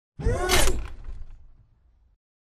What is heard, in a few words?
combat run to idle; Titanfall 2